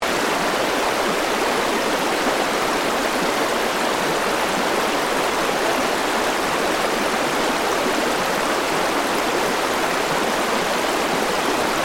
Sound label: River Loop; Water; Nature; BlastWave